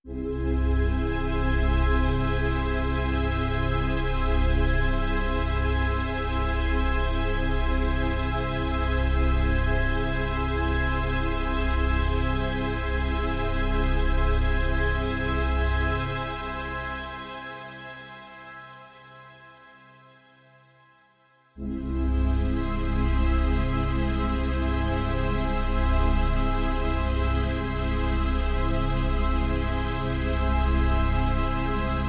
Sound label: Just an Augmented Chord